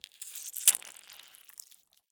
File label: Gore Blood Squeeze Drip; Just Gore AddOn; soundbits